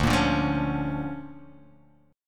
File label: D Minor Major 7th Sharp 5th